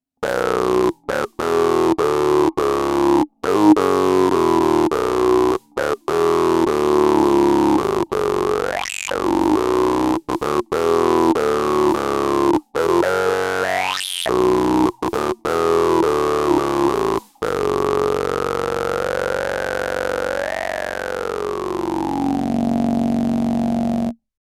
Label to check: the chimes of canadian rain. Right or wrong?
Wrong